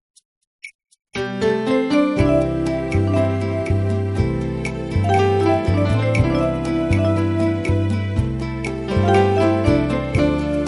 tropical guitar

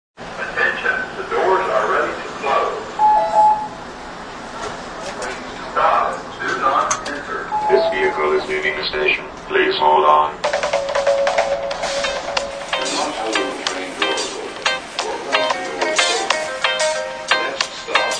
steel dub

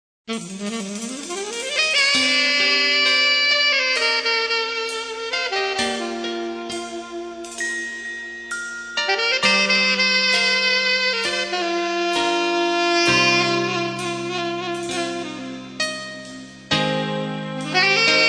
steel pan sax

